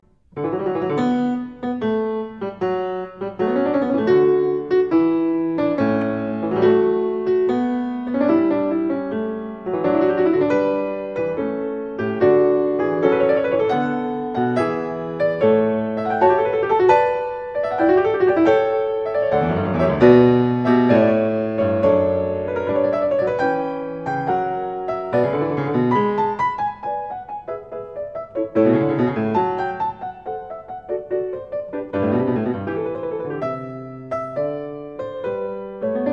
Prelude And Fugue In D Major Fuga; BWV 850

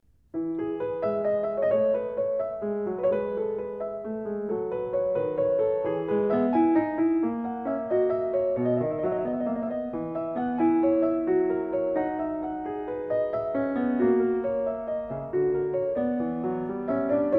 Prelude Fugue In E Major Praeludium; BWV 854

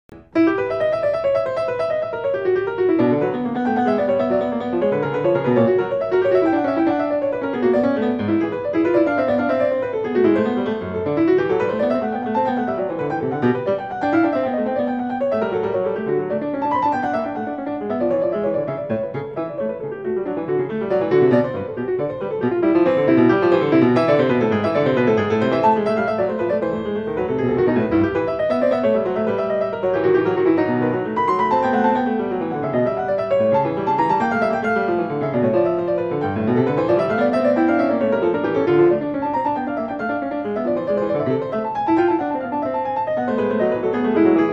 Prelude And Fugue In E Minor Fuga; BWV 855

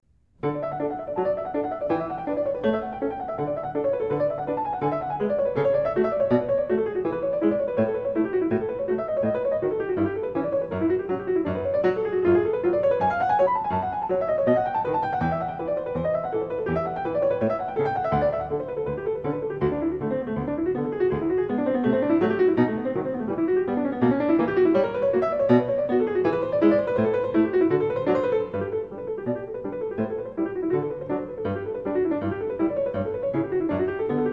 Prelude And Fugue In D Major Praeludium; BWV 850

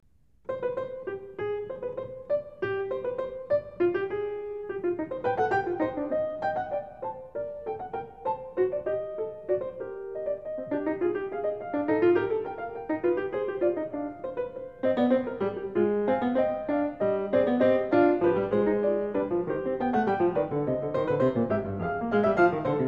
Prelude And Fugue In C Minor Fuga; BWV 847

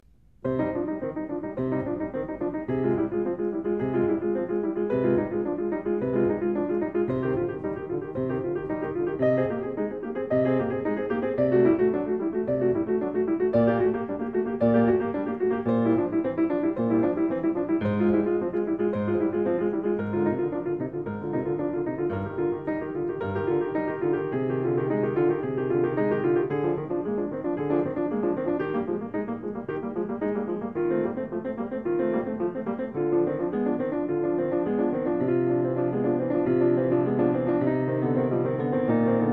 Prelude And Fugue In C Minor Praeludium; BWV 847

